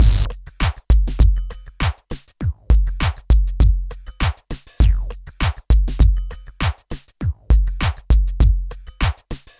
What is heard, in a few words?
drloop, drumloops, Vinyl Attack; Masterbits